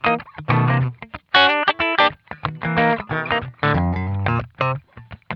Funk Guitar; Zero G - Funk Guitar

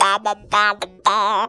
Funky Vocals; USB Soundscan vol.18; AKAI

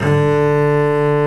BASS D2, SoloStringCombo; E-MU Producer Series Vol. 2; More Studio Essentials; Composer

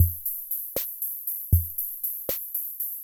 Loop, classic machines, rhythm77

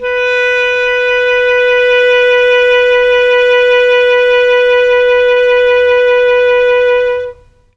B3 clarinet, Mellotron; Mike Pinder - Mellotron Archive CD; CHM CLARINET

